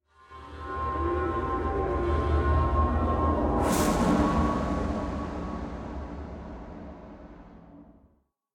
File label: stinger; HDF Ch2 WelltoFoyer